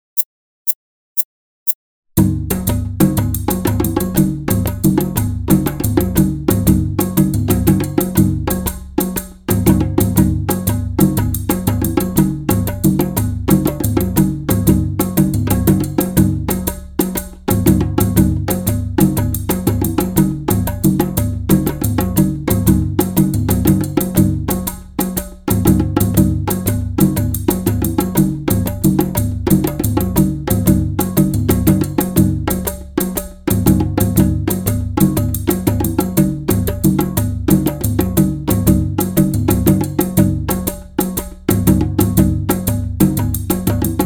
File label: Bamboo